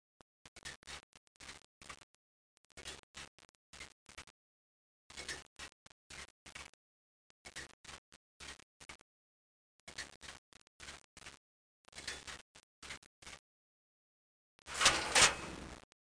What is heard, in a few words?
vending, shopping; ambient